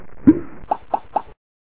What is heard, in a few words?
tree hit